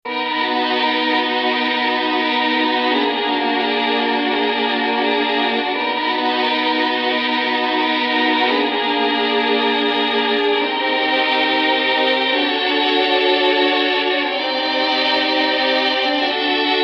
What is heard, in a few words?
Violins Speaker